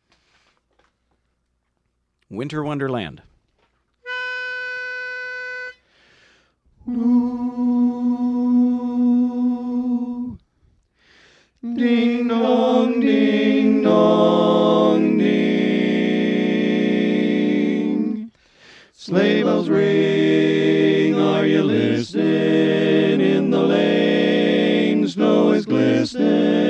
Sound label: baritone